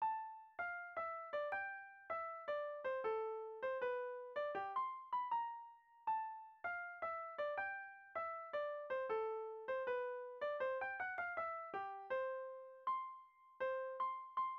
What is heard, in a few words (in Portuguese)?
DÁ NELE (melodia)